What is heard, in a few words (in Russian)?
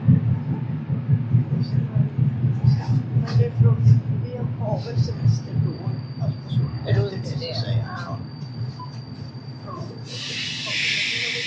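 не работает касса в супермаркете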